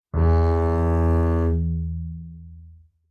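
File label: Bass arco fortissimo sul A E2, Double Bass; MIS Pitches - 2014; Strings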